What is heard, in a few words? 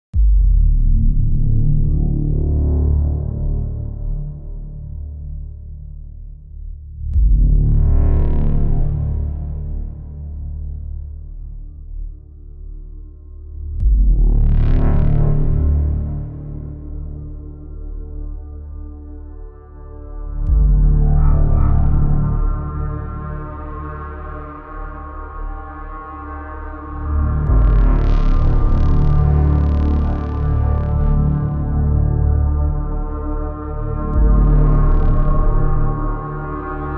SciFi Horror Drone; gamerip; homebound vr; vr windows